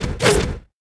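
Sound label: Warrior Attack; Mara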